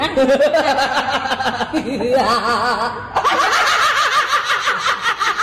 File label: laughing 2 girls